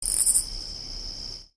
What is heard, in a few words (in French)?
Insèctes de la nuit